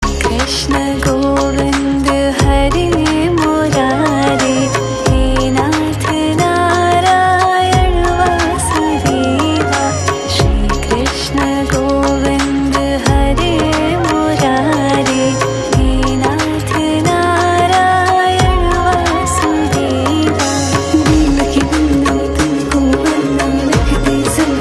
FEMALE VERSION RINGTONE

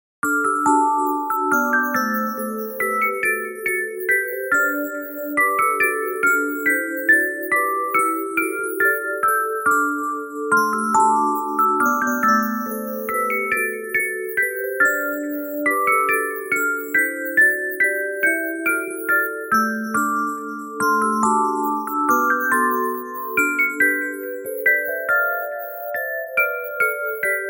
The First Noel alarm ringtone

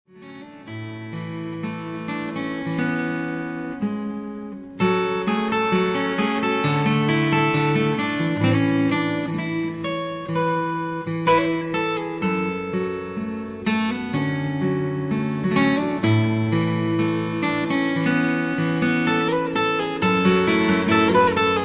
GUITAR

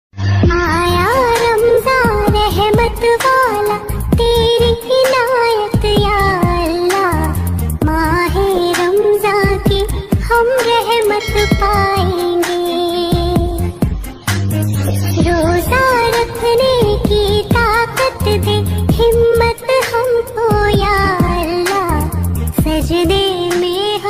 ISLAMIC GOJOL RINGTONE

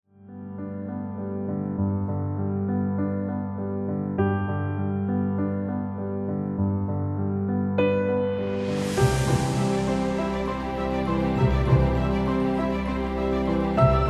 ROMANTIC ALARM RINGTONE

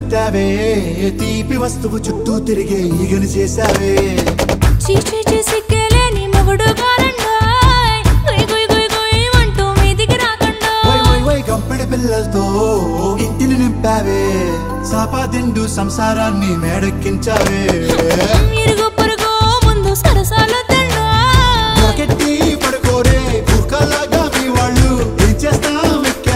SONG RINGTONE, TELUGU

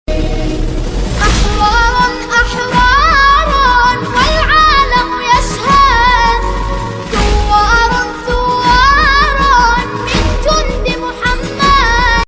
ARABIC ISLAMIC RINGTONE

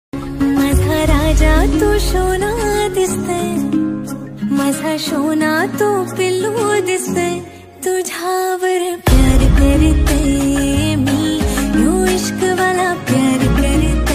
MARATHI LOVE SONG RINGTONE

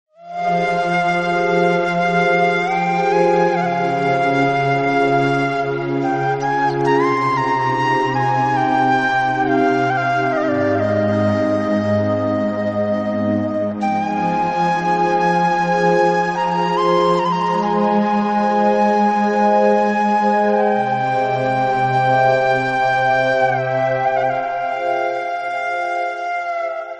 theme instrumental ringtone; BHAKTI

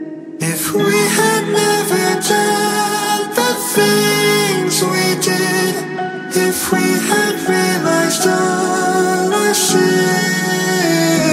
SLEEP WELL RINGTONE

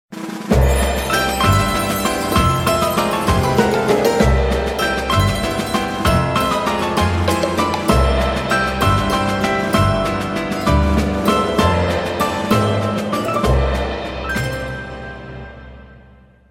GAME RINGTONE, INSTRUMENTAL; CANDY CRUSH

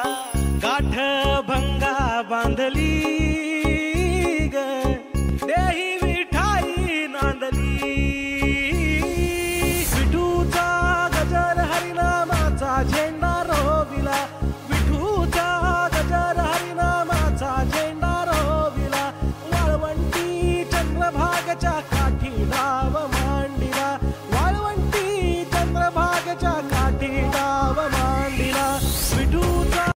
RINGTONE, BHAKTI

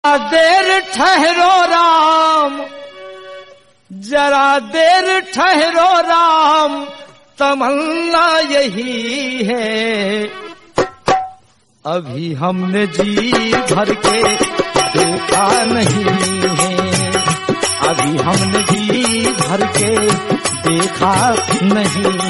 BHAJAN RINGTONE

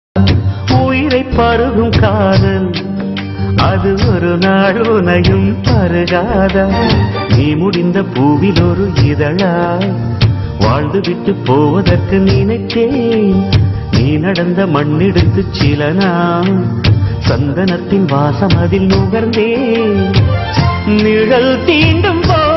RINGTONE; TAMIL

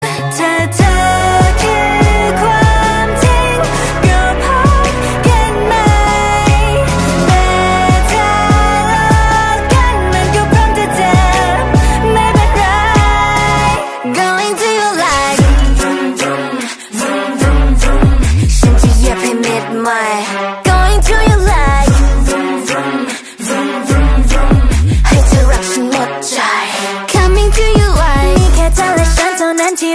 RINGTONE, KOREAN

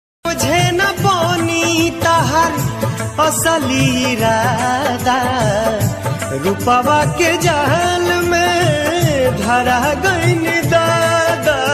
BHOJPURI SAD STATUS RINGTONE

